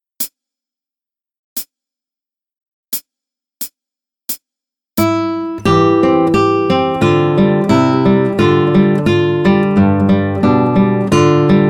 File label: Danza (Trad.-Spain), Guitar; Play Guitar Gitarrenschule 1